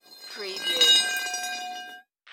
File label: Crowbar Drag Scrape